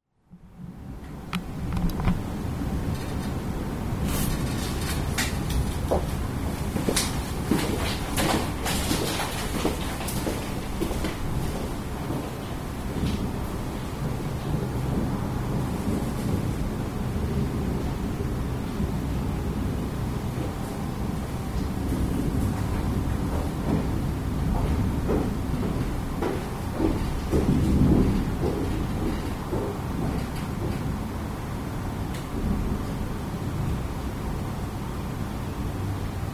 office - distant thunder; fieldrecs

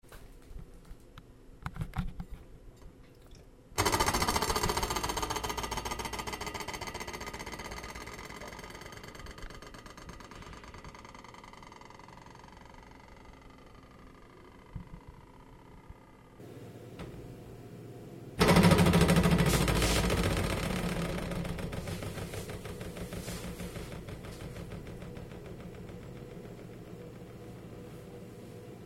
plate on kitchen sink; fieldrecs

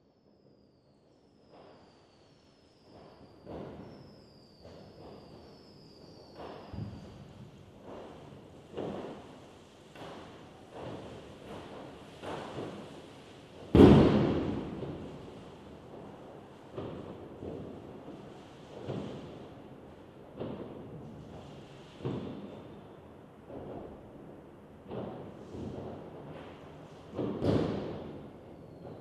fireworks nye; fieldrecs

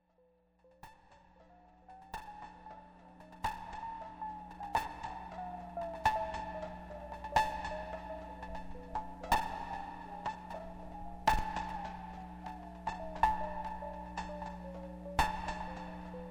washing machine

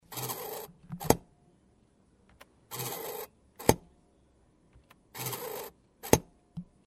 motor-lock; fieldrecs

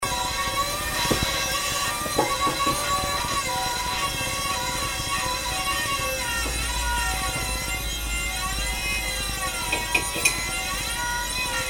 singing kettle; fieldrecs

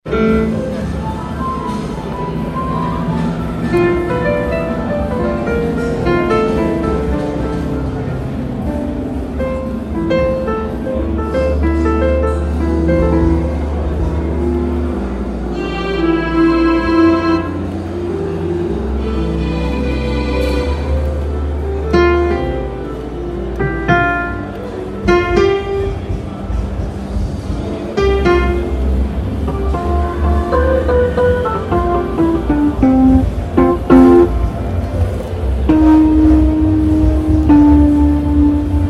messe frankfurt piano synth; fieldrecs

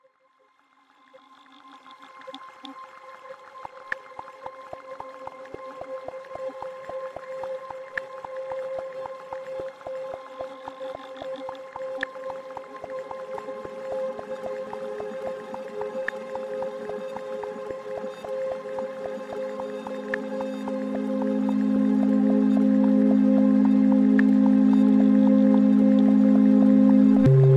machine music, simple machines

